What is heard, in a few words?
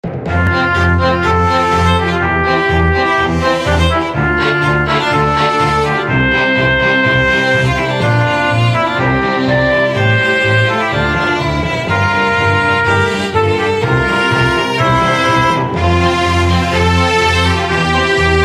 Bgm Fantasy; rpg maker mv